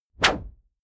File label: whoosh noise sweep hit short